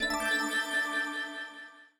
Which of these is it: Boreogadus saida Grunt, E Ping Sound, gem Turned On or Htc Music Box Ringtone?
gem Turned On